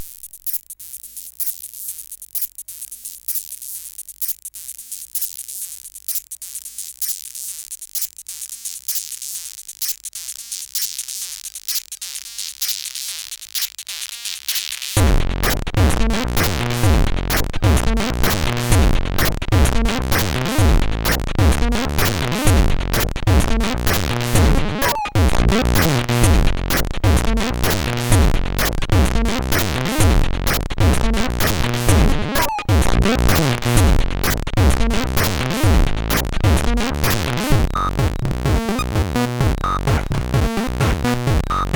Loop Song sid CSG8580R5; MiniSEQ

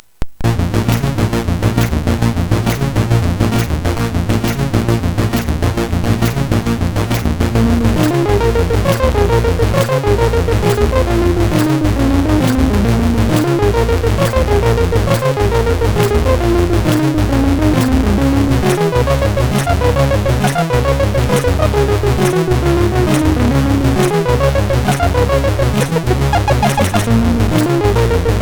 sid, MOS6581R4

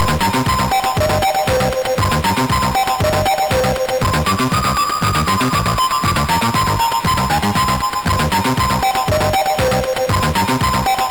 sid MOS6581R4

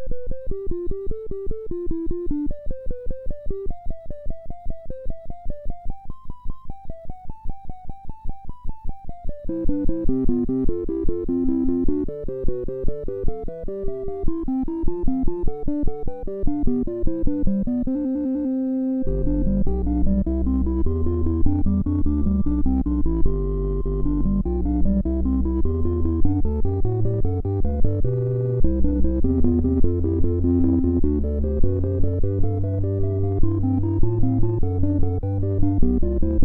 Orgel Spieler, sid MOS6581R4

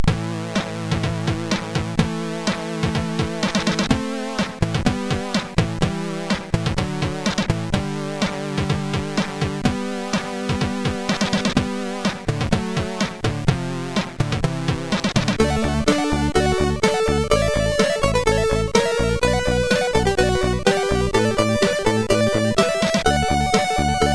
sid, MOS6581; Victory

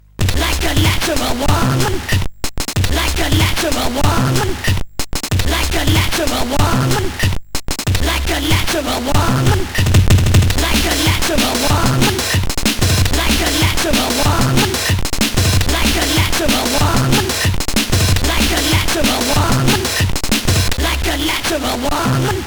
sid CSG8580R5

